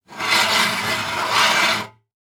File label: Technology Electronic Light 650 Watt Fresnel Light Barn Doors Spinning